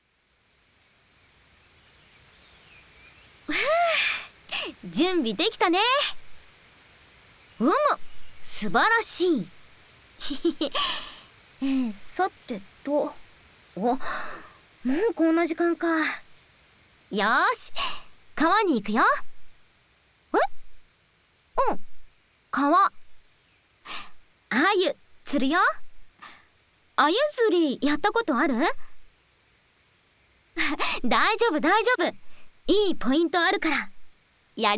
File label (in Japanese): 川の音・川釣りASMR; 鮎を釣っちゃおう♪